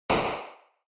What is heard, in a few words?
SNES RPG Door